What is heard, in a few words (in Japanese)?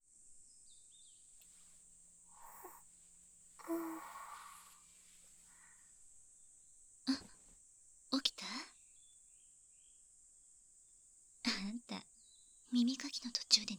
安眠・耳かき